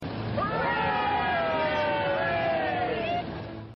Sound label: Woodpecker; Niagara